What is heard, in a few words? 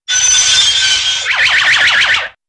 Hi pitched Whirl Jit, crashes